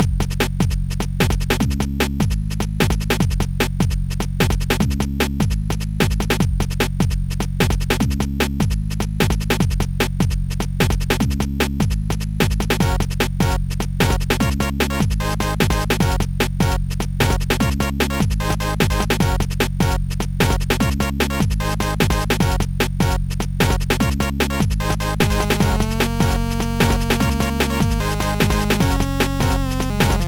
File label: funky beat muzak; Trackers; Modland